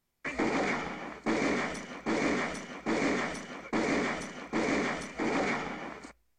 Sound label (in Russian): zapusk snaryadov i lyazg metalla